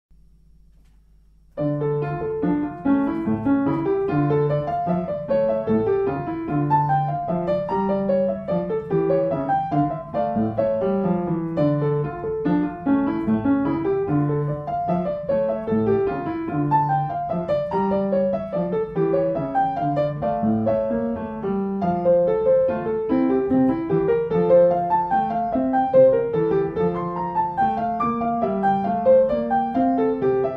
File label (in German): Menuett d Moll